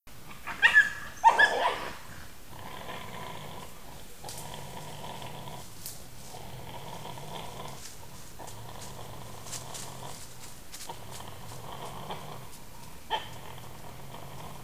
verso cuccioli lupo appenninico canis lupus italicus wolf wolves lobo loup

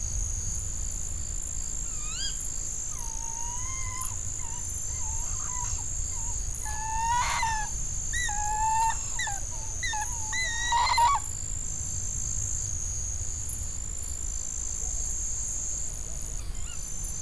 canto verso duetto allocco strix aluco tawny owl waldkauz carabo comun chouette hulotte

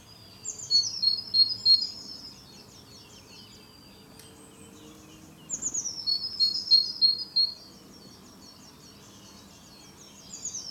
canto cinciarella allegra cinciarella Cyanistes parus caeruleus blue tit blaumeise; herrerillo; bioacustica

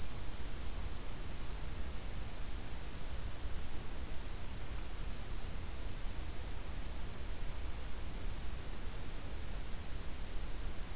notte cicale; Soundscapes

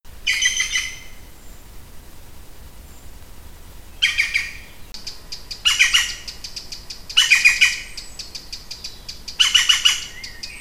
Verso canto risata Picchio nero dryocopus martius black woodpecker Schwarzspecht; pic noir; bioacustica

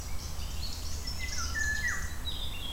canto maschio territoriale rigogolo oriolus eurasian golden oriole pirol oropendola dorada; bioacustica

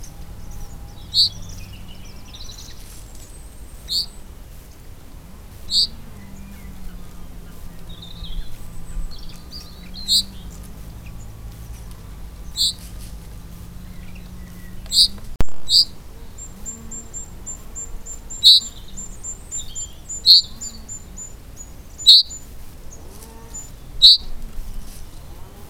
verso fringuello fringilla coelebs chaffinch buchfink pinzon vulgar; bioacustica

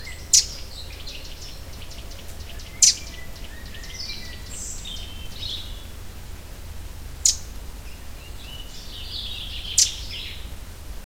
verso canto scricciolo troglodytes eurasian wren chochin comun; bioacustica

